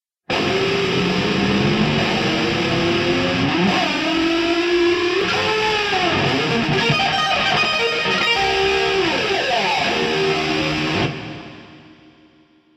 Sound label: lick; music licks; brutal legend